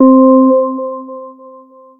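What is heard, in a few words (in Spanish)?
estilo tono sintetico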